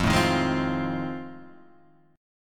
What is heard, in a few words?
F 7th Sharp 9th; F7#9 Chord {1 0 1 1 1 1} strummed